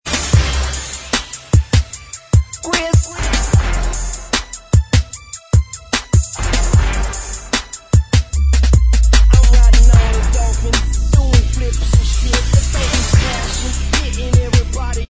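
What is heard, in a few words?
backing vocals, track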